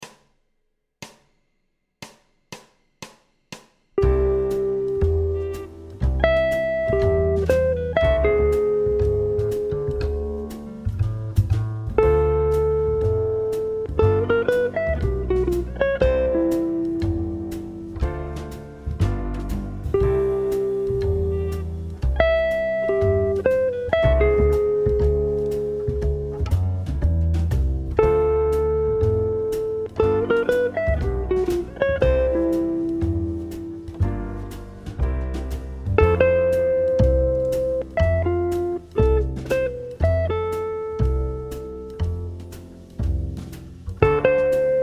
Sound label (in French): aigu 120bpm, guitare jazz; melodie